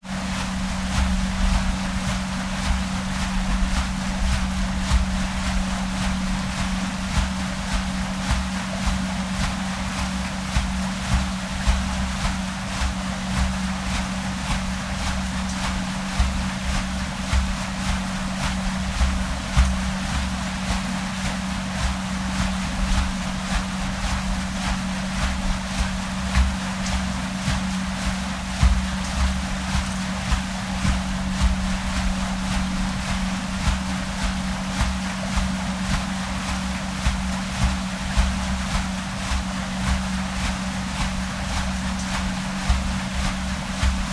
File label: Paddlewheel moving slowly. Ship, Paddlewh